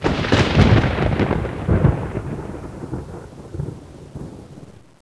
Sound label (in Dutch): onweer